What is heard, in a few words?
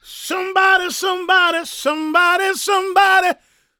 GOSP MALE, Vocal Gospel; Vocal Planet Vol1 Gospel